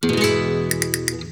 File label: Castanets